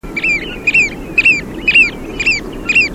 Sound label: Haematopus ostralegus (song)